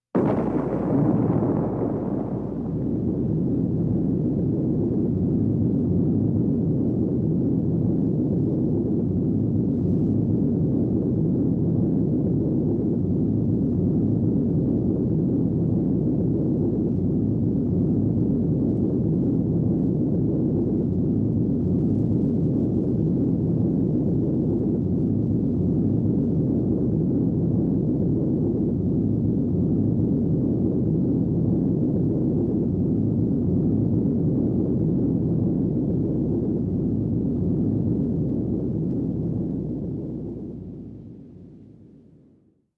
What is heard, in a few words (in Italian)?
Esplosione Nucleare